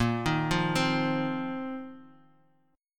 Bb Suspended 2nd Suspended 4th Bb Guitar Half Step Down